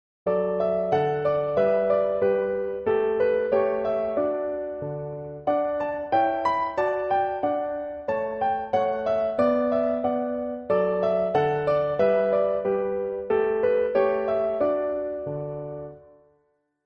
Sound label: Accompaniment Track